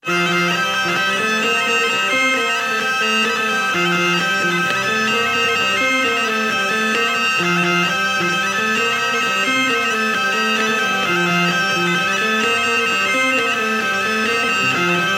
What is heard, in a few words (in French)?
polka; polka A Chastagnol Polka De L Abiru